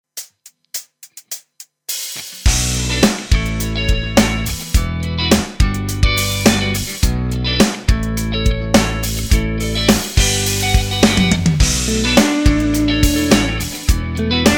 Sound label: rock fusion, drum kit